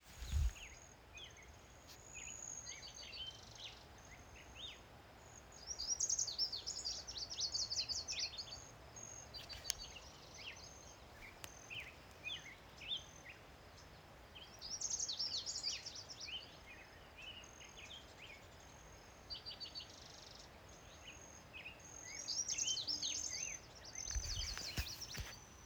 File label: virginia warbler